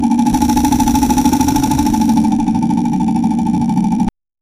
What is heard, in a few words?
slot machine; gate of olympus tarz bir